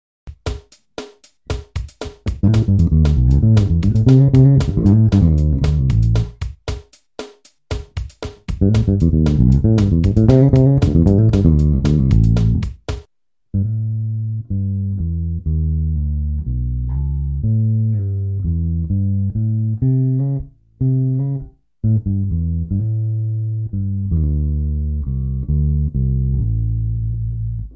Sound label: A7 fast bass fill